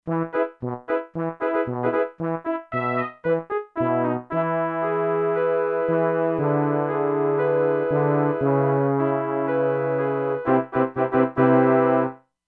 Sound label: Chamber Brass, E-mu Proteus-2; Factory Presets